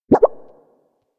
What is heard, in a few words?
steps cloud jump down; anemoi